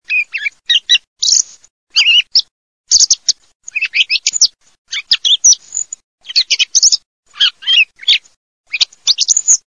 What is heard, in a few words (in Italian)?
TORDO